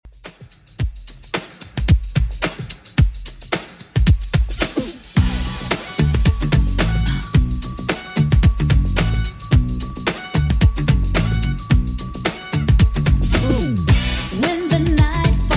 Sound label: 90s Redrum 112bpm